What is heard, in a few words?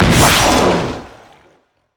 leap; rengar